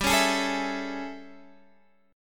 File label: A Major 7th G